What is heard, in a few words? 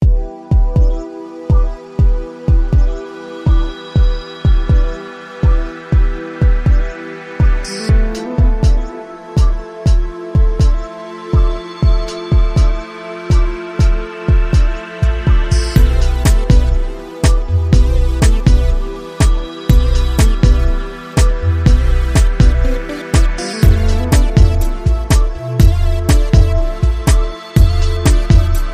Electro Excellence